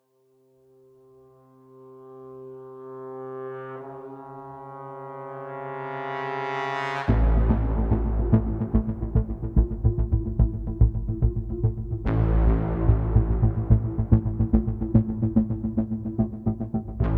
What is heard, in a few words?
String; Assassin's Creed Unity